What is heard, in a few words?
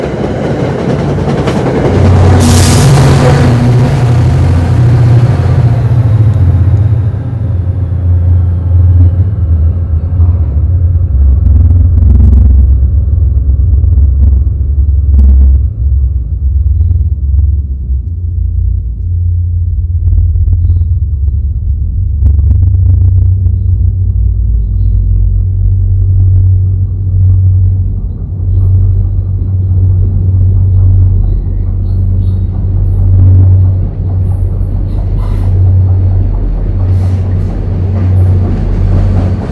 low tunnel